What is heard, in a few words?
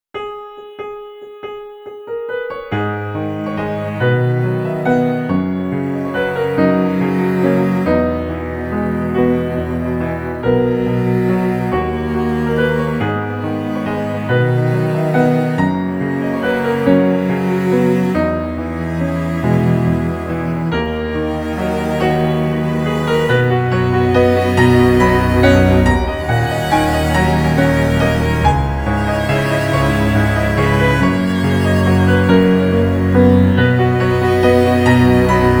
instrumental piano